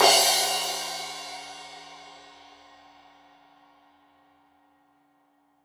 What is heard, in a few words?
Crash 15in L edge, Cymbals Stereo